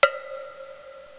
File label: Clonk